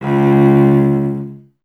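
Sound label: strings cello C#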